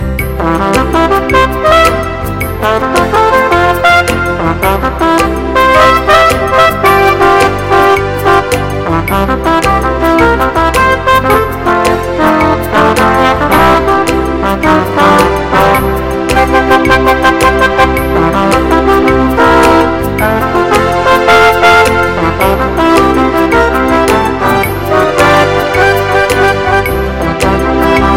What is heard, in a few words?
Hot Shuffling Along